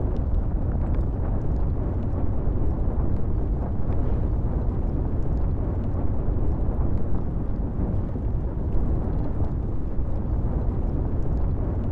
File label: fire